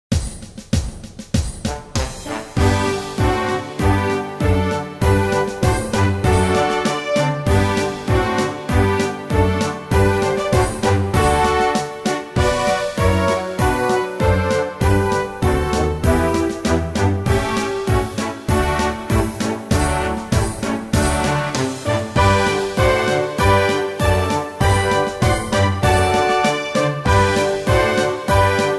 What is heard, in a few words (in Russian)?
marsh